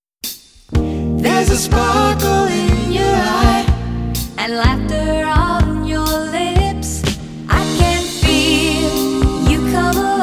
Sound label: Suntory Pop Jingle